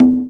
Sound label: CONGA; E-Lab; X Static Goldmine 2